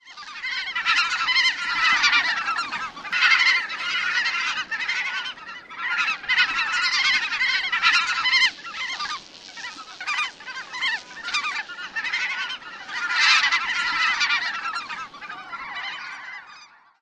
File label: calls of flock; AK